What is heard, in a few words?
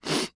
Human Male Sniff Short